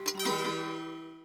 Harp; Chapter1 Trollcaves

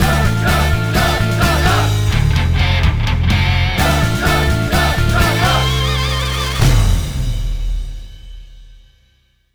Jetpack Mission Theme; blitz brigade; android

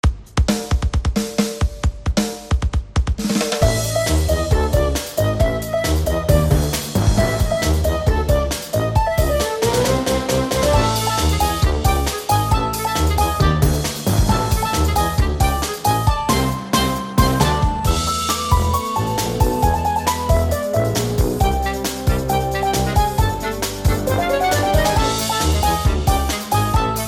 Bonus Round Medieval; Crash Bandicoot N. Sane Trilogy; PS4, Switch, Windows, Xbox One

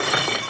Pause Menu Start; Fling a Thing; Android, iOS, Online

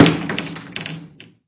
Toilet door

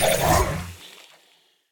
Templo Wolf Drive; Super Mombo Quest

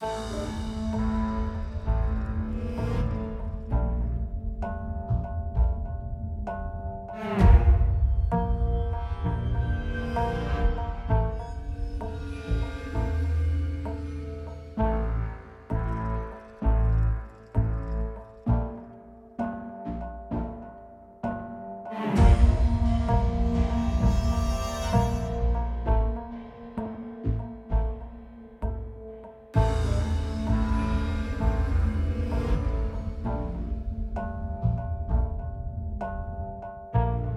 Suspense; Taxidermist Suspense (Halloween)

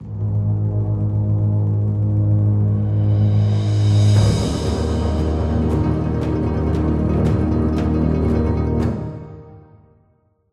Set Up Chase Loop

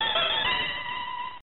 Coin; Full Throttle; X68000

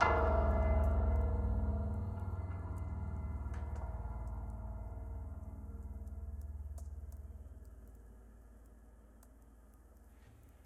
Burning Piano; Ghost Parade

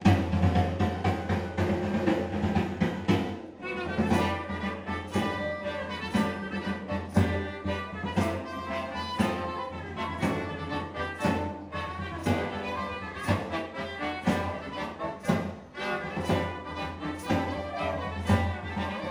Tavern; Baldur's Gate Enhanced Edition Unofficial Soundtrack

